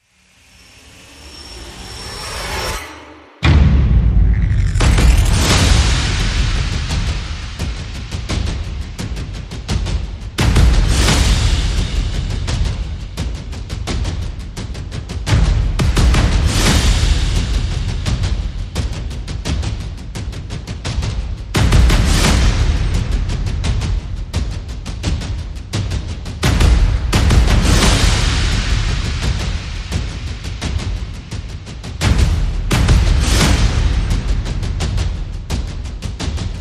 Perc and FX

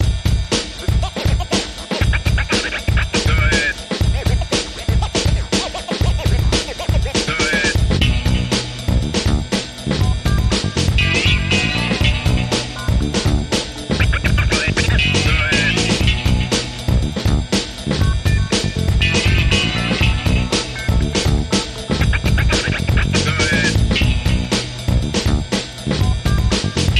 Chipmunk Funk

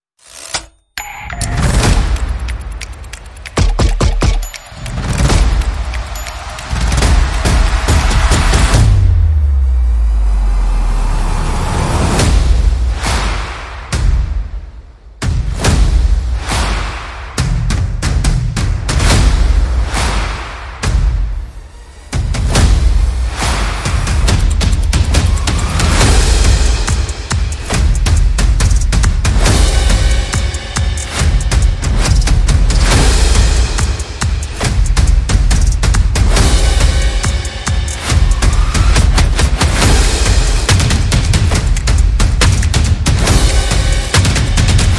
Percussion and Effects

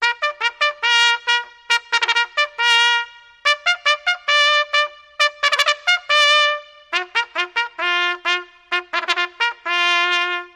Call To Mess, Solo Bugle Call Reverb

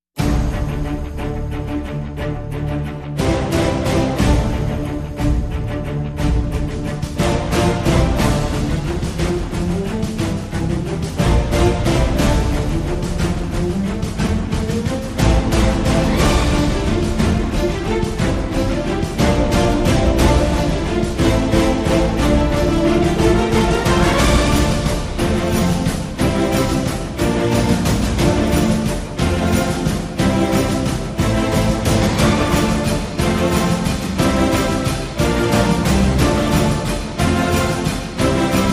No Choir

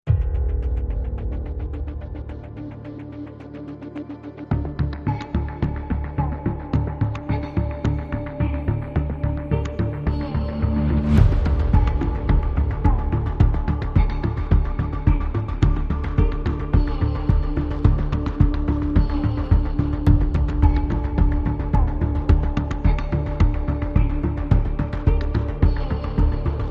No Piano

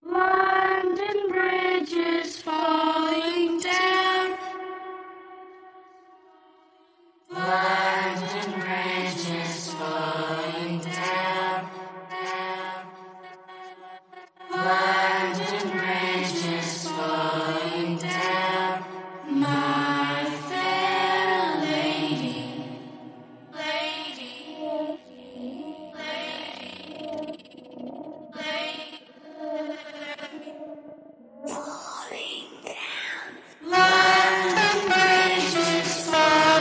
Horror, Vocals Only

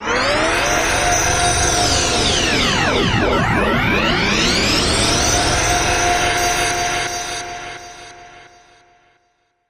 Big Spacey Wipe

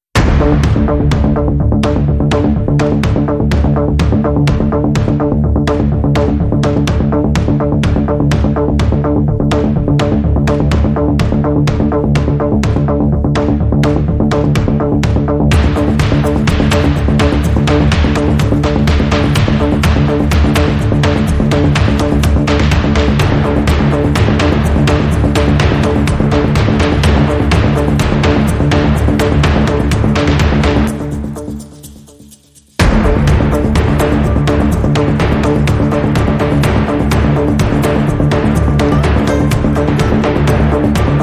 Underscore

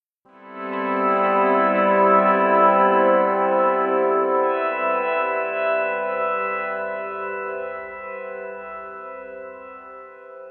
AMB Guitar

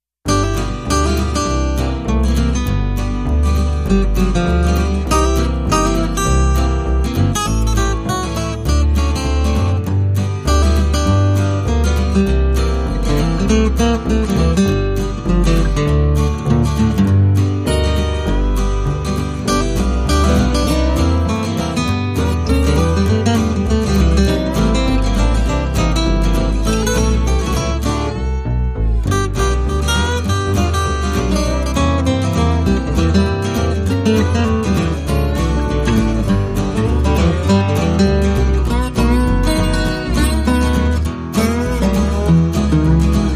Backyard Swingtime No Percussion

